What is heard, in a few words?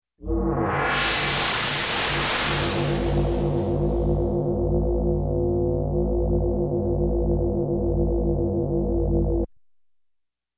Space Mystery Stab, Electronic; Sound Ideas 2000 Series